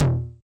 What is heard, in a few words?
Yamaha DJX 2B, Hard Tom